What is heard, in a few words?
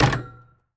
Street Ball Glass Back; SFX Kit